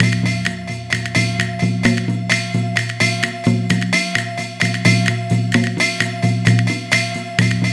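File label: percussion mix 130 BPM, left; INIS - Opium; PERCS 130BPM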